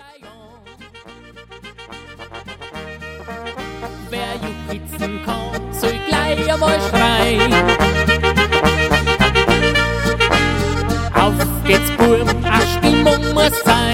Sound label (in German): Landlerisch Pongauerisch